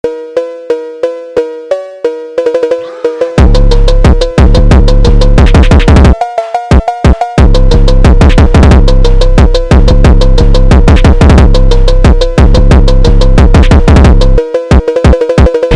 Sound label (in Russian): PHONK ФОНК бассы фонк сила пхонк